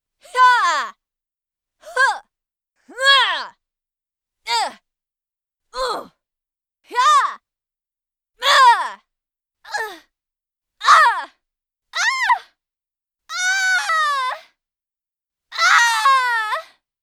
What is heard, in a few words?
Fighting Game Grunts Young Female